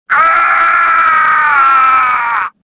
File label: falling, swamp; swamp2; Star Wars Jedi Knight II Jedi Outcast; XBOX